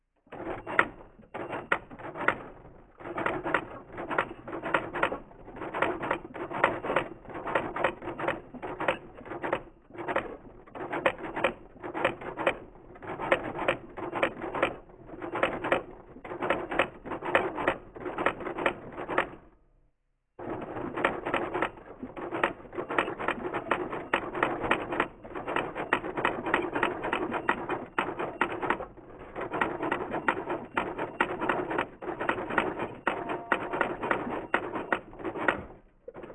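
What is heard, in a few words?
typewriter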